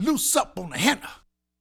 Vocal, 70s Analog Funk; Sonic Foundry (Sony Creative Software); What it Is! 70s Analog Funk

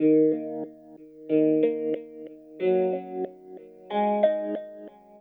GTR, Hip-Hop & R&B; USB Soundscan vol.47; AKAI

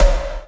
808 Snare, Kit; Sonic Foundry (Sony Creative Software); Esoterik Beatz

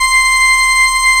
SIEL, String Machines

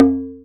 Tubano, drum machines

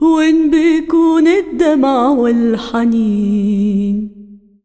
SINGER, ArabianTraditions

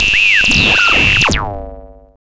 FX, attacks

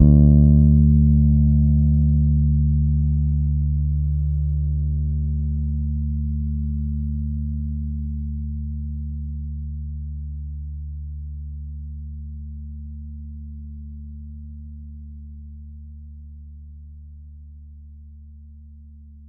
Ds D3 soft, Bass, Pedulla Thunderbass; Giga Samples Collection

